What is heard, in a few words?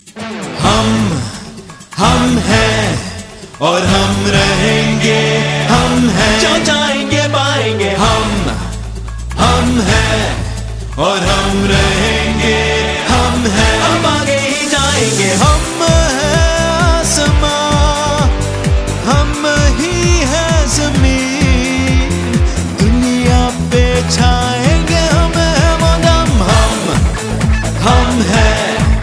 humming, ringtones; blues